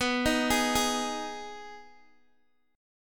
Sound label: B 6th B down Guitar Open D